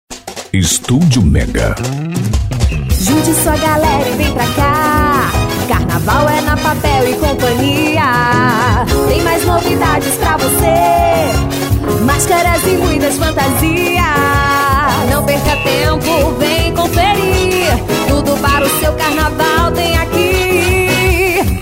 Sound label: JINGLE, Carnaval; PAPEL E CIA